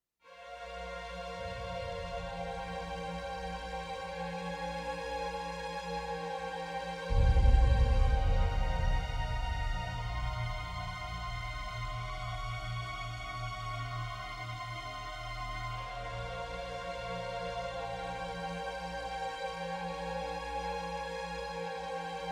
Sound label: String; String Thing 2